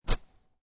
Golf Miss Hit; Sports

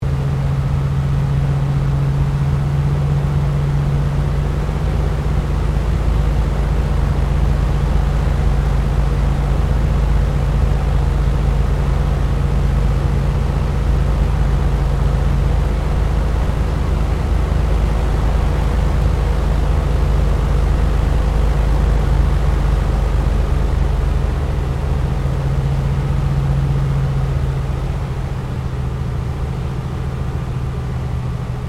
AIRCRAFT CA-13 BOOMERANG LANDING INTERIOR; Airplanes (Sound Effects:Vehicles)

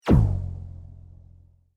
Sweep Mechanical Fast; Created sweeps, swooshes and other movement effects; Sweepers; Sound Design

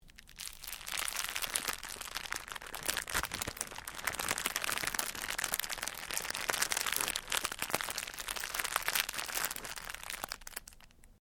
Cellophane crinkle

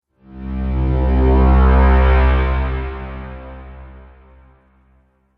Audio Logo Element Laser Motion; Sonic Logo Sound Effects

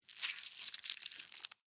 Insect Move Slimy, Movement, Wet, Squish, Bug; Animal

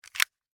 Seat Belt Unbuckle, Automobile; Transportation; Cars; BlastWave

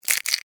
Insect Claw Crunch, Snap, Movement, Bug; Animal

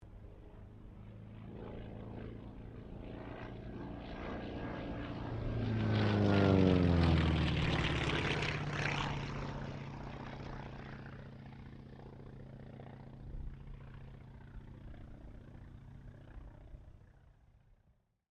AIRCRAFT T-28D TROJAN PASS

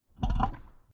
Monster Burp Scary Mouth Noise; Sound Design; Monster Sound Effects

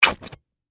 Ketchup Bottle, Squirt, Empty, Suction; Household; Food (Sound Effects)

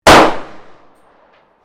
PISTOL TANFOGLIO 38 SUPER FIRING SINGLE; Handguns, non military; Sound Effects:Guns

